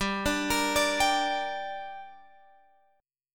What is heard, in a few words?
G Minor G down Guitar Drop D, chord